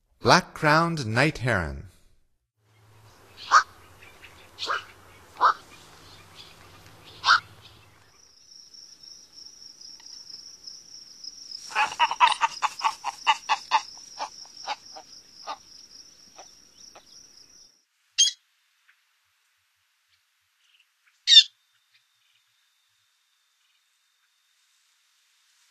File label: Black-crowned Night-Heron, Songs of Birds of North America; Stokes